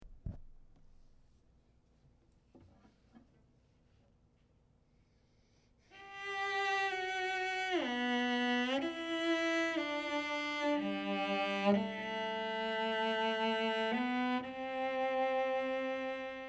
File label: cello; The Swan